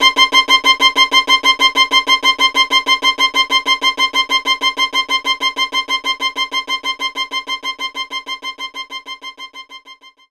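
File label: Violin Strike C6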